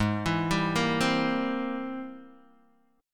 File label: Ab Augmented Major 9th Ab Guitar DADGAD